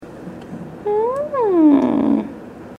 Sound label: uhumm